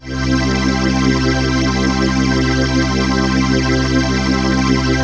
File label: PHASE PAD, ATMO PADS; Best Service; XX Large